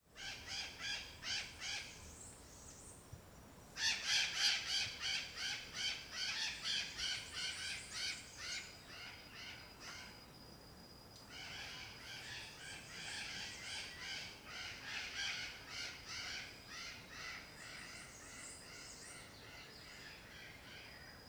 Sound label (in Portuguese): Araras passam cantando; arara caninde